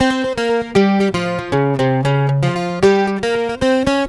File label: guitar, stringed instruments